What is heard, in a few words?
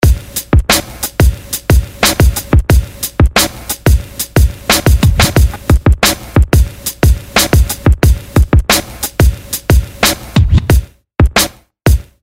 Hiphop Boy Sms